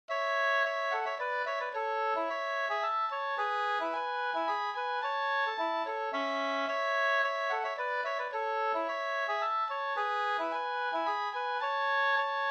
polska Bodapolska

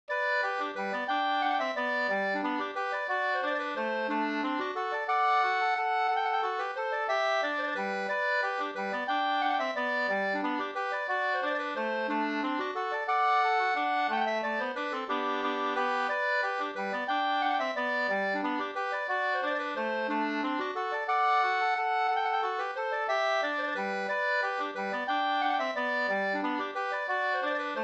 vals springvals; Bohlins springvals Up

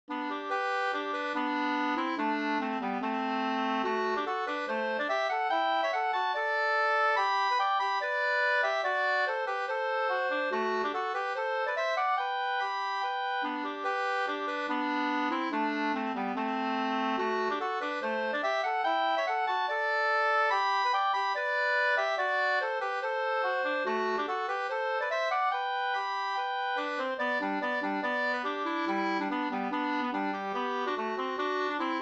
schottis 4-4

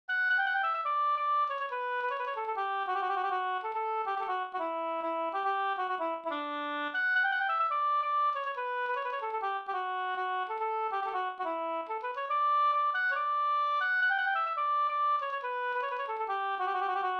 reinlender; fr Finnskogen e Soren Tornkvist Vr

